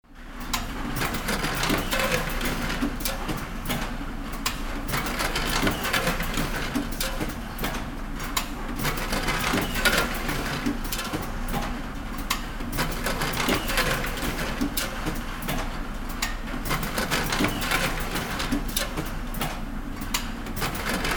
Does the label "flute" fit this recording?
No